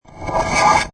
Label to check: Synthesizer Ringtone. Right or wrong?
Wrong